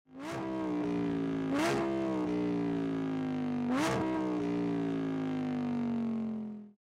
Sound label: Downshift; ABT XGT; ABT Sportsline